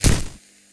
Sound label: elec zap